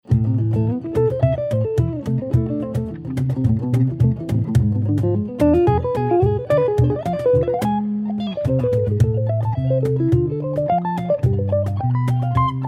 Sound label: Classical Breeze